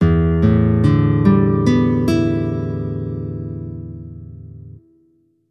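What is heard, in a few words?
guitar tune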